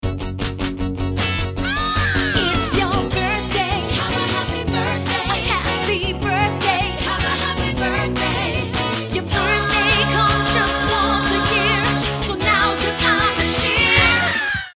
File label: SATB